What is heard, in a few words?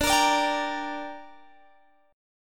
D Suspended 4th Sharp 5th, Guitar, Open G